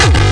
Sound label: psycore buzzin kick; drum machines